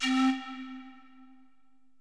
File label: panflute